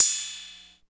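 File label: OPEN HAT; East West; Phat & Phunky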